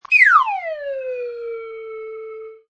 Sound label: Diving sound made by a slide whistle, good for cartoons; good for cartoons and animation